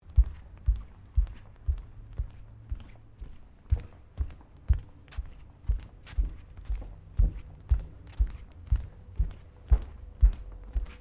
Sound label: Footsteps barefeet tiles sequence